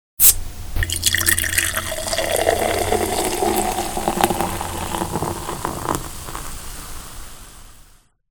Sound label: Bottle sequence; SOUND EFFECTS1